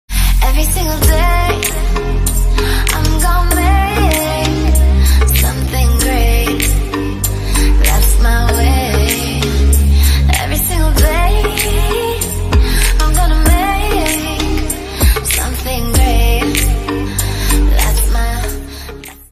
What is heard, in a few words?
english ringtone